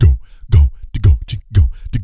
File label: GO BOY, Vocal Planet, Blues Soul; Spectrasonics